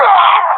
death; Counter Strike; CS:GO; professional epic

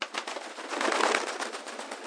bird flapping

